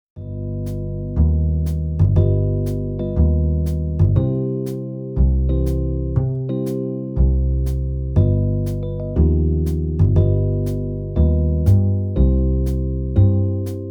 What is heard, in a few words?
V I in C Play Along